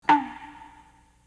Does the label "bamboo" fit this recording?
Yes